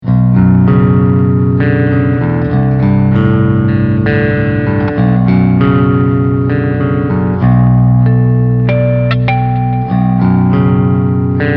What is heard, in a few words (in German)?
Clean Picking beide Pickups